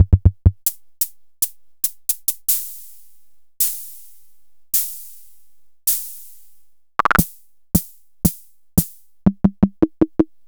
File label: Fricke MFB 501